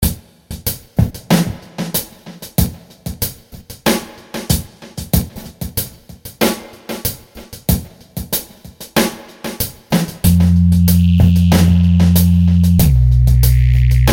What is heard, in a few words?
Little ballad in the major